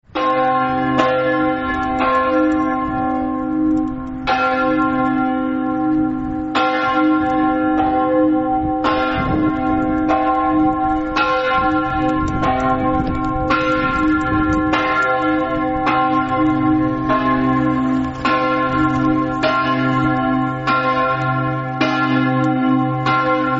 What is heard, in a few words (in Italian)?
san vito, campane